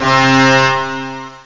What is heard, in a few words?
pipe organ brass; OctaMED SoundStudio V1; mirage